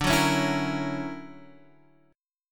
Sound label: D Augmented Major 9th down Guitar Standard; D+M9 strummed; JGuitar